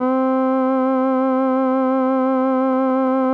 C3, SYNTH WAVES; Music Store Sound Service; Einstein

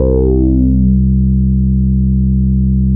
C2, Bass; E-MU Producer Series Vol. 6 – Analogia Project; Boom da Bass

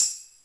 Tambourine, Roland JV-2080

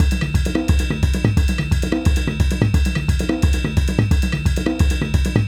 African Drum 75 bpm; Quazibeat; African Secret; African Drums

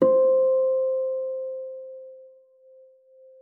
FLAG B4, harp; Xsample VOL-8; HARP+FX

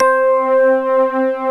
TINE STRINGS

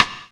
DRM; Best Service ProSamples vol.55 - Retro Sampler; AKAI; EMU SP12+120

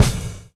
BD; Best Service; Dance Mega Drums

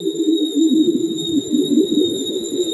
BASE, ELECTRONC

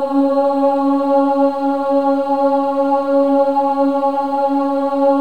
OH C#4; Spectrasonics

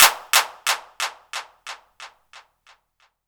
clap, Korg Electribe ER-1

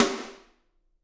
SD, Drums; Bob Clearmountain Drums I

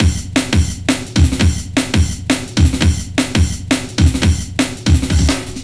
Hammer Break, Drum & Bass, Beats & Breaks; Fluid Dynamics

